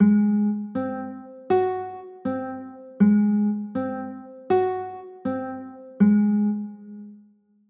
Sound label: a flat 6